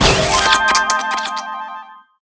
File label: chocolate box large; sfx